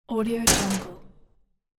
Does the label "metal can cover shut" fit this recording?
Yes